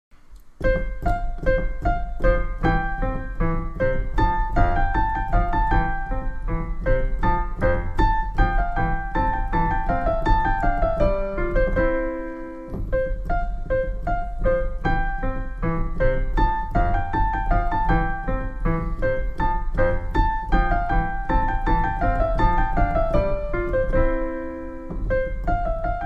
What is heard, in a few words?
gavotta; corelli arcangelo